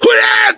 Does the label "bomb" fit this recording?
No